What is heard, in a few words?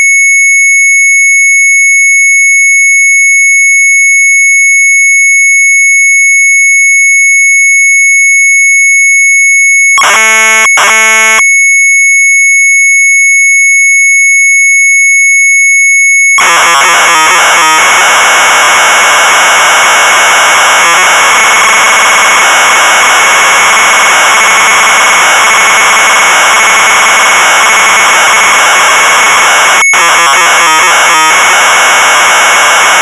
MZ800 Tape; sharp; SPOOKGROT; retromelsarchive